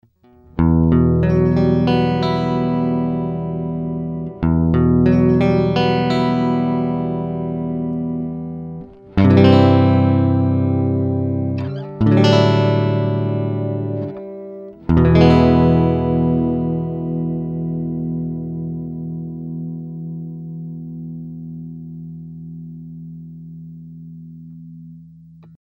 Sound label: old strings